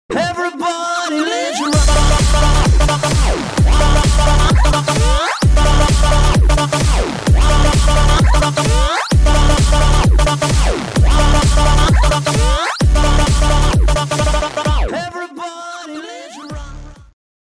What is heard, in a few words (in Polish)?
klubowe zm